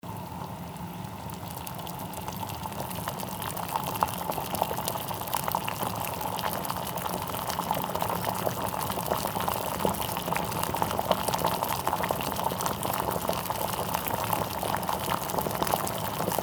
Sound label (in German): Reisewasserkocher offen